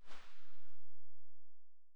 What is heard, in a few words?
sa58 silencer very far, weapons; STALKER; IWP